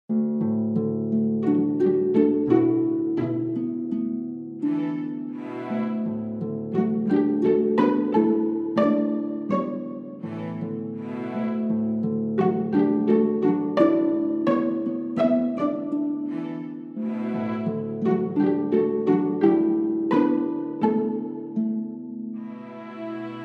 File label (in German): weiblich harp